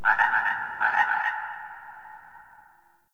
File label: Swamp Croak Distant; Ambience; Midrath Island